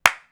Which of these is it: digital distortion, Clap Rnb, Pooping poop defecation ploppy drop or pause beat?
Clap Rnb